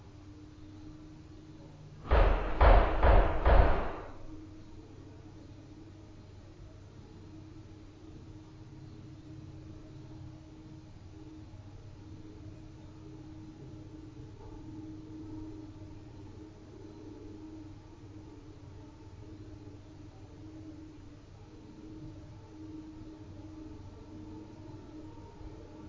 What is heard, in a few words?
Static Empty House Located on Main Staircase